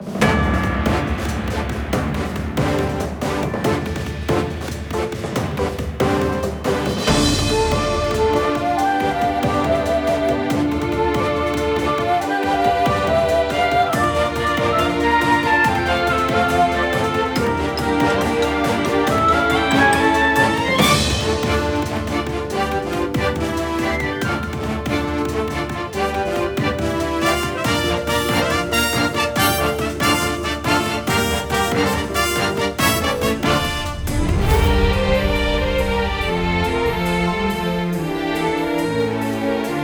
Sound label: Training Arrange (Intense)